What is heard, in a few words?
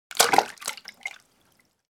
Liquid paint sloshing paint can